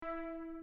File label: fl clip